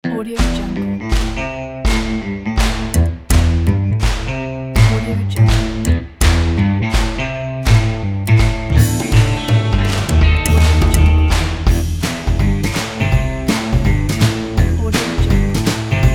spy jazz ident

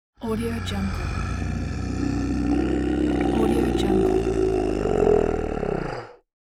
monster ultra long growl

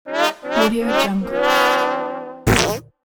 sad trombone fail

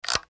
cordless telephone antenna up; sound ideas

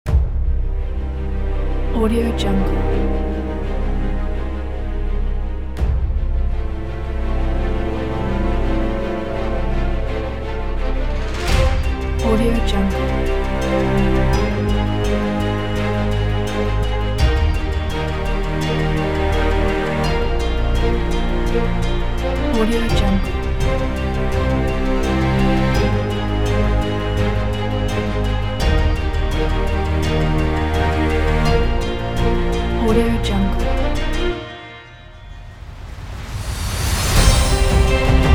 epical trailer

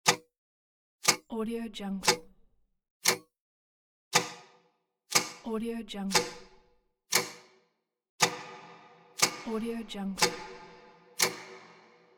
clock ticking loops

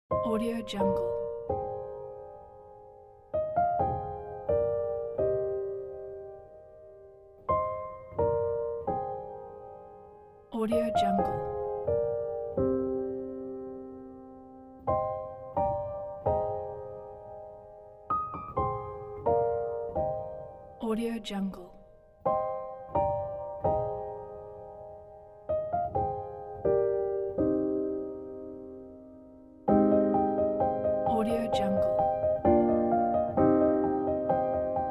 piano

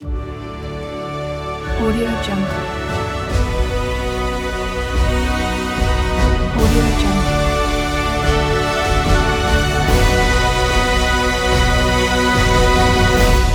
inspiring cinematic intro logo; aurasound

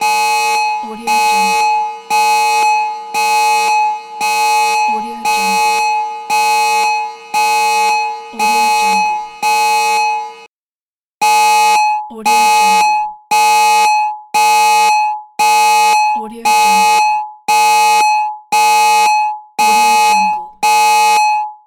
alarm

